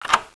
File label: lock; duke nukem total meltdown